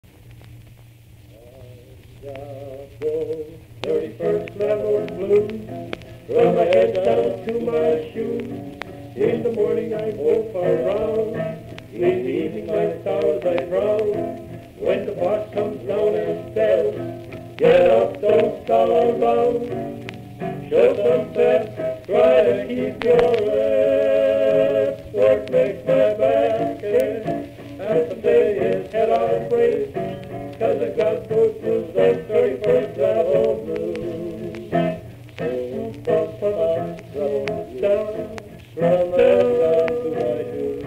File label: Thirty-First Level Blues; usa; michigan